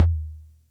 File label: Percussion Computer Tom